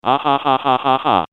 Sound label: laugh long; pyro; johnmadden